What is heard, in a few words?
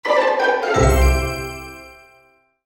Hidden Block Jingle